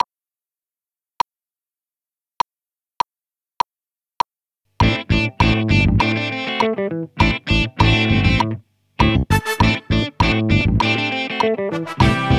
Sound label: Guitar